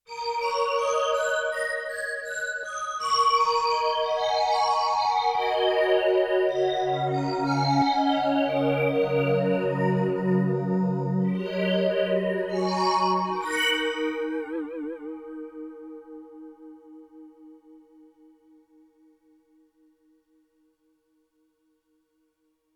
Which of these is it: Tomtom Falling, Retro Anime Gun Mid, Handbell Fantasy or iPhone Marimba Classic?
Handbell Fantasy